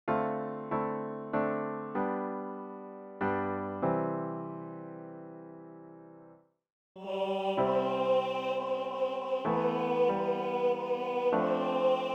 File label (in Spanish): tenor; Cantata para SATB, Solistas y Narración; CORO Hombres TTBB